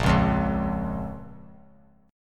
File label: A sharp Minor A sharp down; strummed; JGuitar